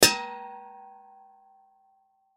Propane Tank Impact, Propane Tank Impact Hit Hammer Soft; Machinery (Sound Effects:Machines); Blastwave; Foley